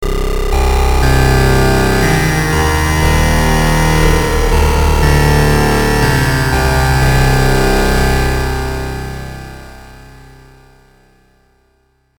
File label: log square